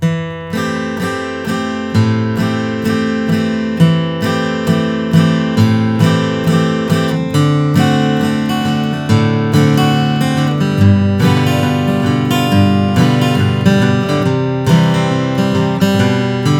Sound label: froggy bottom h 14 deluxe koa